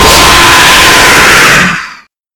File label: Jumpscare Sound; TRTF2; Remake